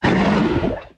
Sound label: stomper warcry big, enemies; Factorio